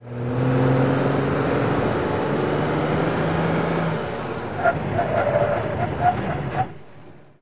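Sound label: SUV Truck 180 Rev Skid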